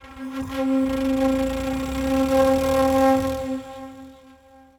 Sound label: FLUTE ETHNO 125